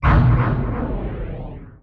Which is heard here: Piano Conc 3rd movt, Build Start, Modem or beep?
Build Start